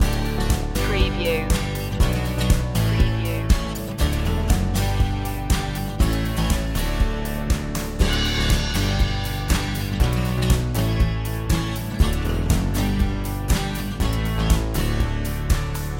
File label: summer acoustic guitar loop